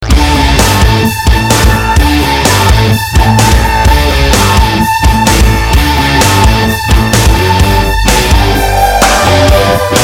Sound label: depressing rock music